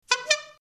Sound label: old horn ballhupe little